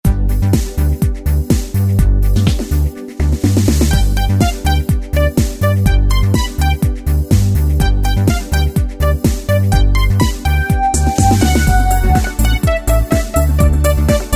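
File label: Yamaha YPT-400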